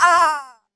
death female; Character Voices

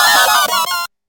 spider skitter; More Creatures

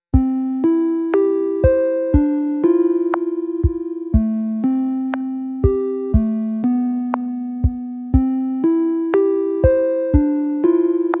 sad moment, music

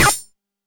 dual swords; Weapons; freepixel

